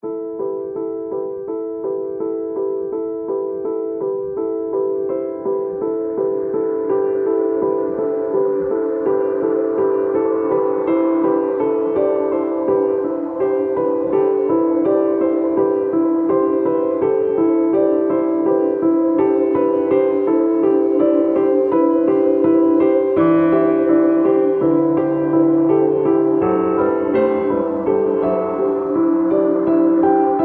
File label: STRANGE AQUA KEYS